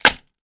wood snap

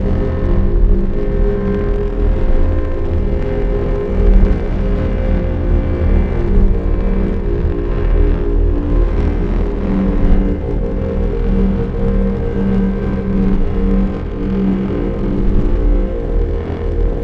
ambience

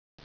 trap

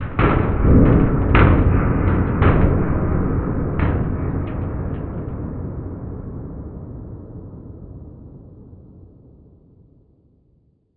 big hit far

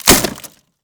wood break

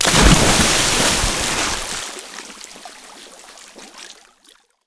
water splash big

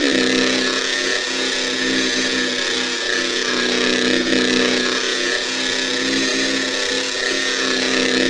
ambience broken energy

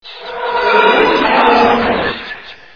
scary vocies

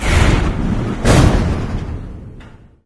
elev open

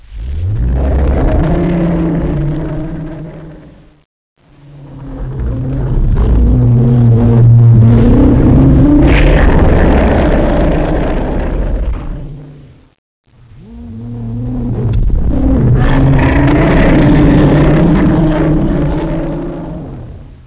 evil ambience right

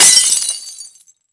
glass break

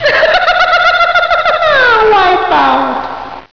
wipeout

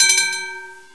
start

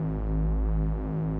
loop elec hum short

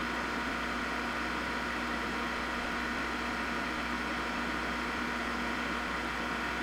ambience

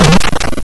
brick break

